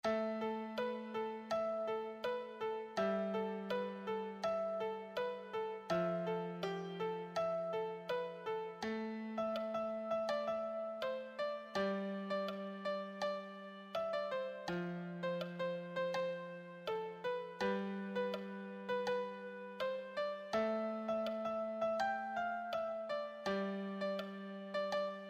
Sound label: beginner solo, piano